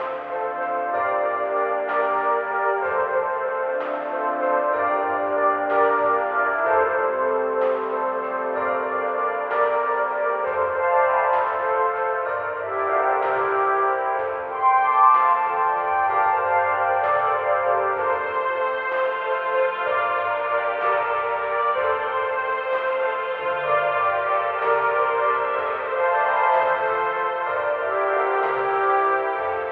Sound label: mall music; revolution; Portal Revolution; scapes 3d